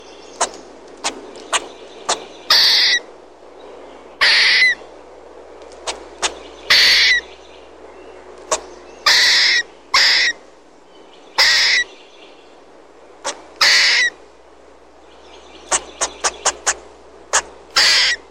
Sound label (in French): ecureuil